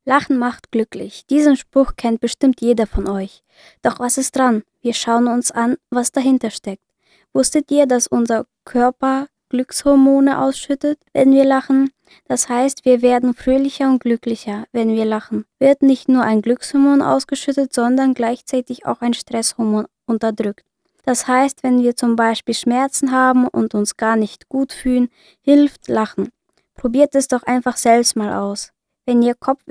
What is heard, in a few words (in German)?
Lachen